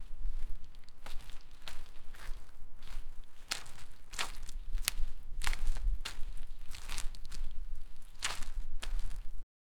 someone runnng through, footsounds

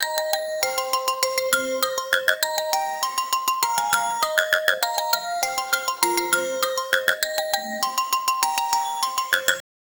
casino reel sound; mechanical clicks

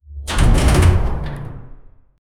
a short clean sci fi slid, spaceship

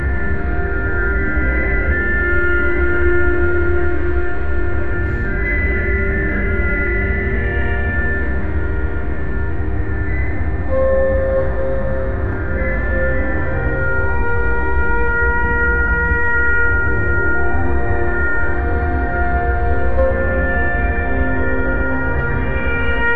create an eerie instrumen, ethnic

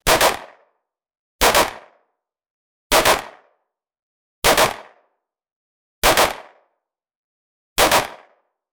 realistic 9mm pistol shot

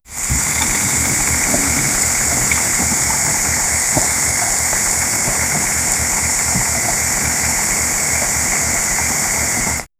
chaff cutter machine sound

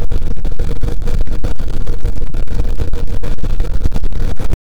an evil flying space robo

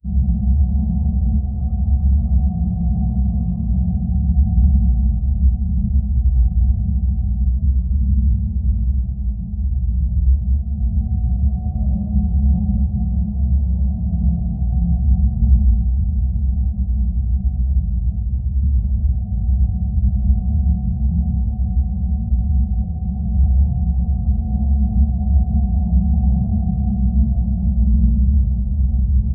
very low pitch scarily di; distressing

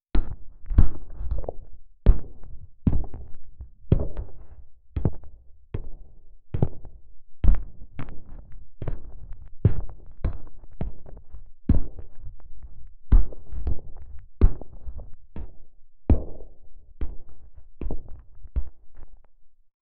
a sci fi soldier walks

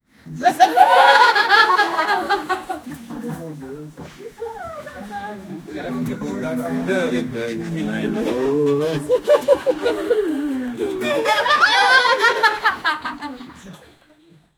a group of friends

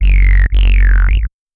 the twisty sandy bass, electronic

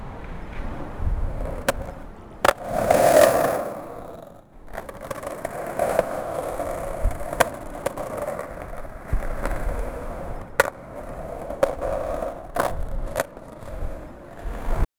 sounds from skatepark; skateboarding

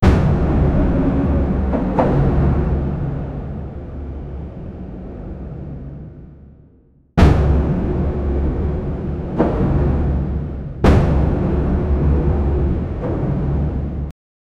ship sonar sonar, marine

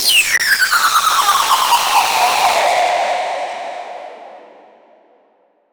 jumpscare, abrupt

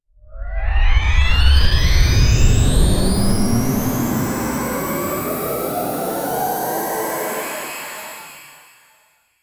a sci fi themed videogame, portal

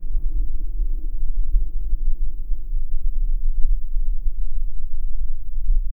drop ambience to silence

